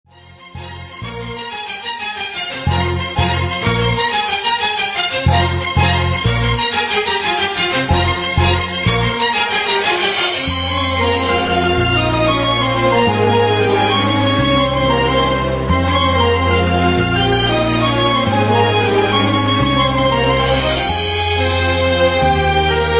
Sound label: ringtone bgm melody ringtone; manmadha movie; simbu; jyothika